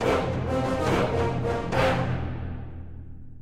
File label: Level Up; Puzzle Forge Dungeon